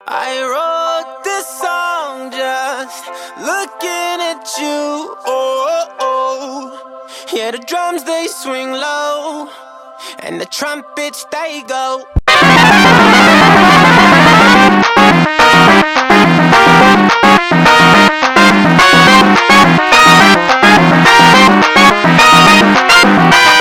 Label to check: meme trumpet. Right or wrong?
Right